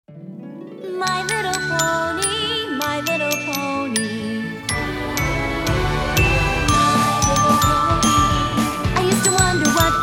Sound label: (Glockenspiel Cover)